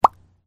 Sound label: pop